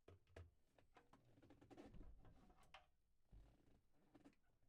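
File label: Kit, drums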